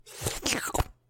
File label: slurp